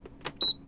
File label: Button Press